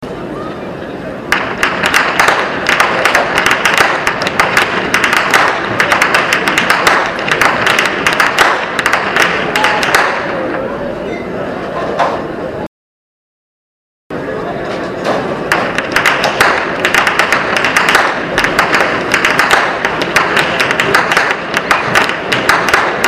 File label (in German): schnalzen, jugend; anthering